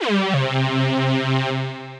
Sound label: Synth Lead; Cant Be Brassed